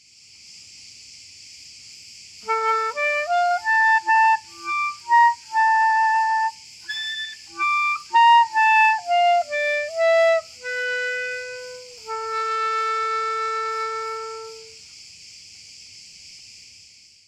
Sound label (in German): Stand Schoen Kurz Spiel